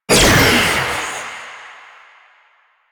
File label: Jade Scythe Proj Whizz; Weapons; Stalker; Warframe